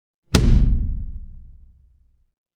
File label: transition impact hit